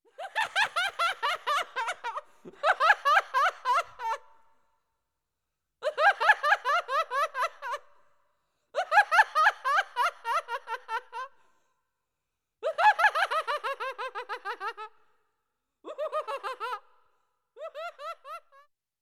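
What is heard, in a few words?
idiotic laughter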